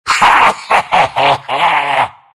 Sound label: big laugh; dota; undying